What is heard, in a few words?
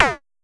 Tom Hi Mid, Pollard Syndrum